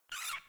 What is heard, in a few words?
Animal Rescue Trapped Hare Call; Encounters